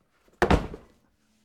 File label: hit body